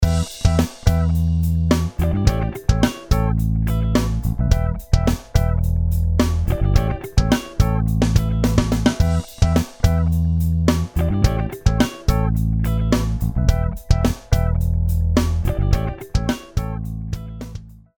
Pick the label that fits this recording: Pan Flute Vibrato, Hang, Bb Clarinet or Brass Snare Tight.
Brass Snare Tight